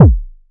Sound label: Bd Mercury, Kick Drums; Zero-G; Soundclash